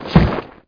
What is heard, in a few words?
bod strk, bugs; Starship Troopers